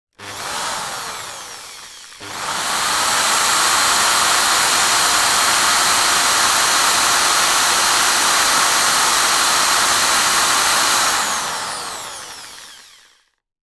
industrial drill short burst leading into longer burst free wheeling